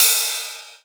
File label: Rides, Cymbals